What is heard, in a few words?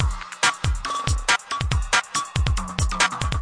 140 roll muted breakme, DRUM LOOPS and BREAKS